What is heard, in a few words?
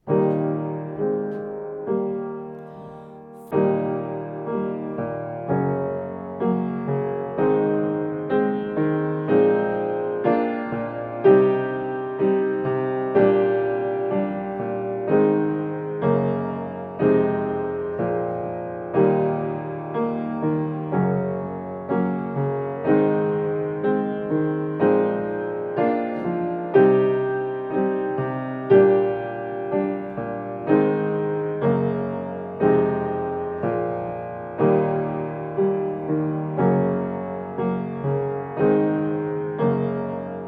piano